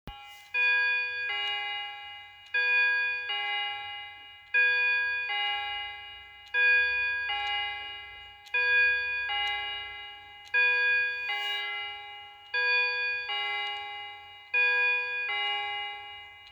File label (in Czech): bim bam, Hodiny; bateriové hodiny; Luxusní bateriové hodiny; kyvadlové pendlovky; AMS 50144